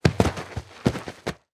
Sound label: Impact Body Fall In Dirt; Impacts